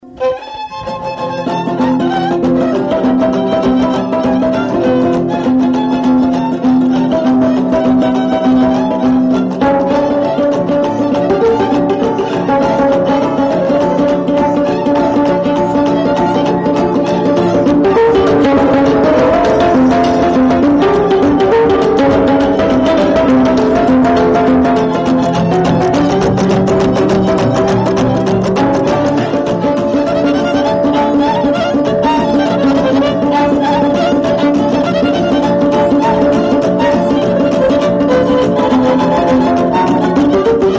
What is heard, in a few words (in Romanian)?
Coasa taraf; jocuri si cantece batranesti; Vaslui